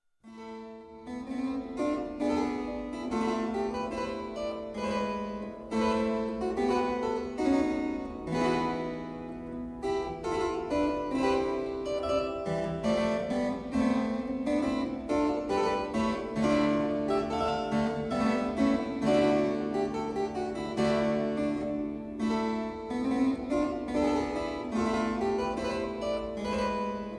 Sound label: galliard